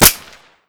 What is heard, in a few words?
SD Fire Local; Weapon Package FortWars